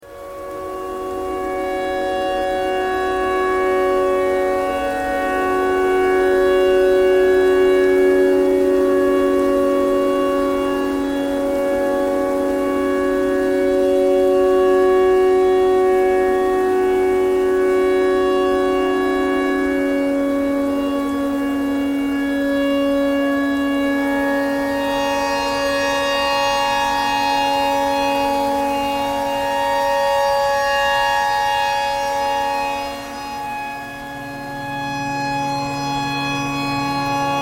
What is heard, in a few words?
tornado siren thread pentatonic